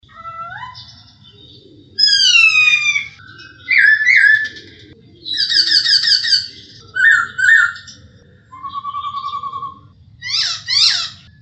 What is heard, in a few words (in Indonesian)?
Suara Poksay Hongkong Gacor